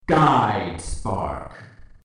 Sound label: rumble epic